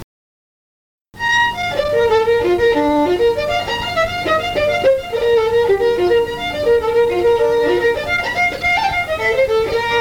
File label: fiddle; arthurs seat